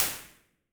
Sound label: cement blocks